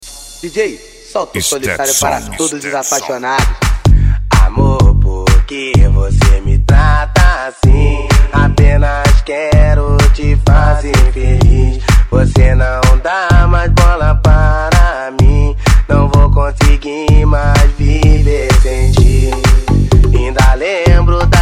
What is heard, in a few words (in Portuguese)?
sound car carnaval